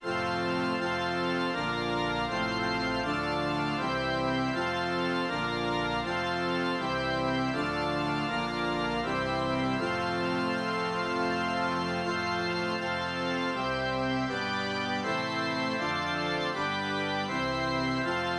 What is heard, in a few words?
organ